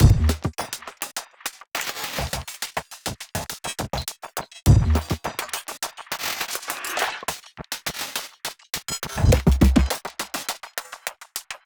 music drums Fight; music ftue drumsFight 02

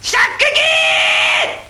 Japanese Shout; gamerip; Mars Sortie

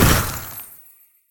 Impact Ice

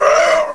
Zombie Attack; Knight Online

